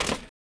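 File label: footstep Stone; droids robots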